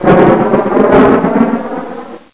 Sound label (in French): orage